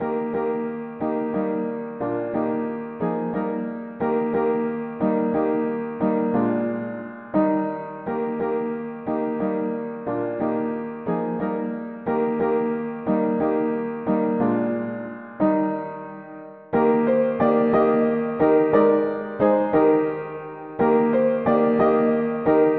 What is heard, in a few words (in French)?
O vous qui n avez pas la paix mono piano